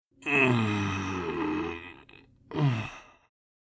Old man exhausted moan

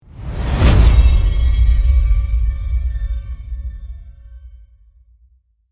Dramatic scare impact suspense hit

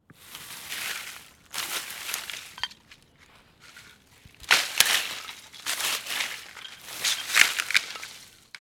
Foliage leaves raking on grass

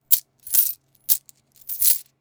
Casino coins being moved around in hand

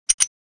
Military hook clipping metal; Military Equipment

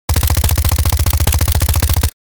Commando submachine gun suppressed burst; gfxsounds

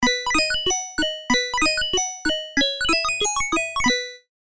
Retro game arcade level complete you win; level up

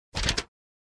Paper folding swish

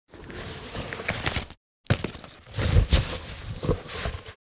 Bulletproof vest put on cloth rustle; Military Equipment

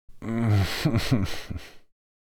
Relaxed man gentle moan

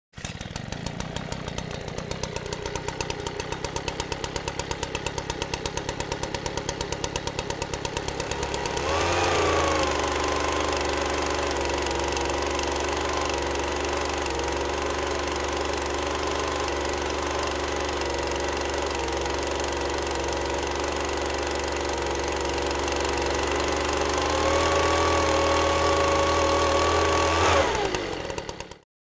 Leaf blower engine noise exterior garden; household

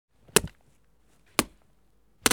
Cutting pieces of meat with knife

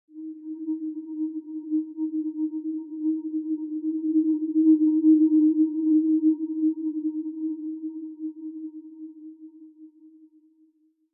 Horror accent low tension

